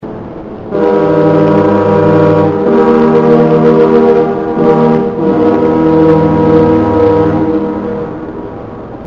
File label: vancouver canada place noon horn